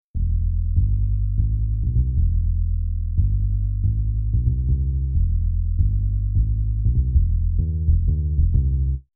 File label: Klick